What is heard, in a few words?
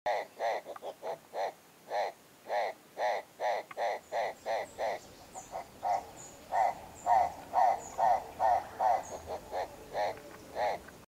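Red crested turaco, bird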